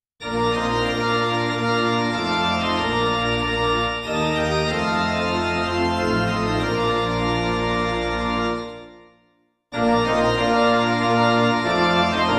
alleluia let the holy anthem rise midi

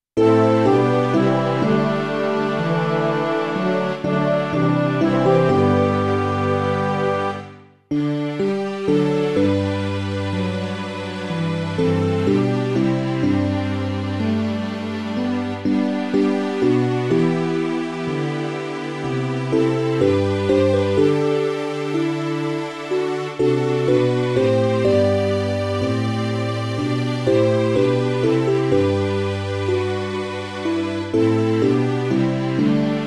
midi

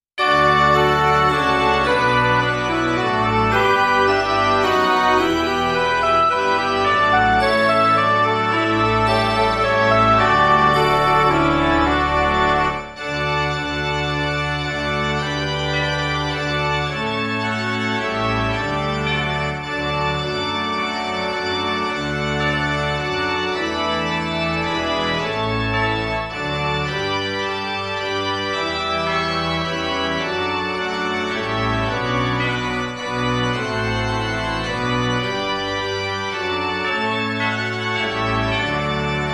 midi

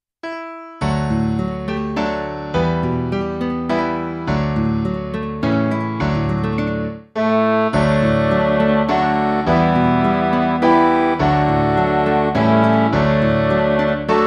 midi

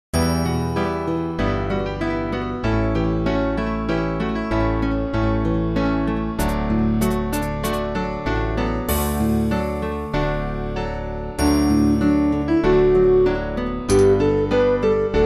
midi

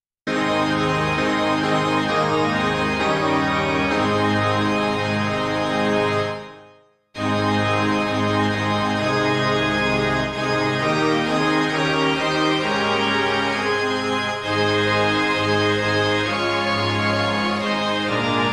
midi

